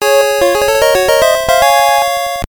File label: Unused Fanfare; pokemon red green beta soundtrack gb gamerip 1996